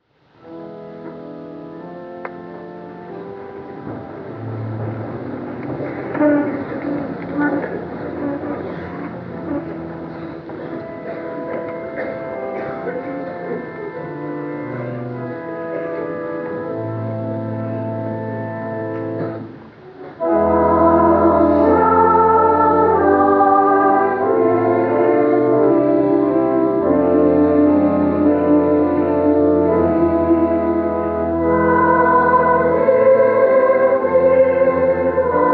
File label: choir organ; Christmas; How Shall I fitly Meet Thee